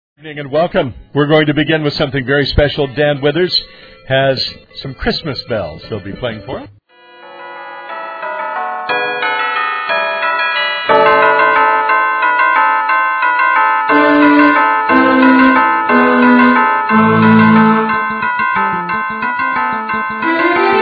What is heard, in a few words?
Bells; 2011 Christmas Eve